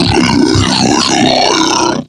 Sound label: sleep idle